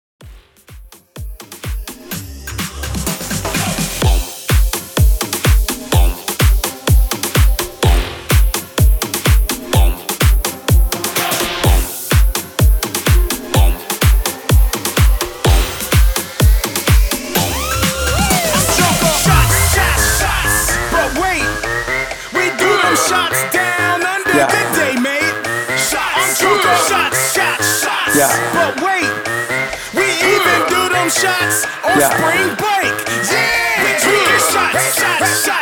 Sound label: reboot 126 bpm